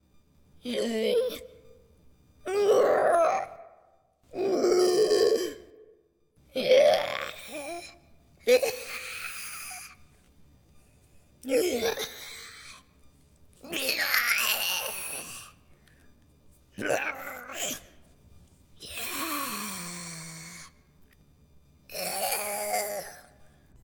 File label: skeleton sounds